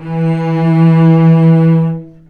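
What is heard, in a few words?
cellos attack E3 R, String; East West; The Ultimate String Collection; VCS ARCO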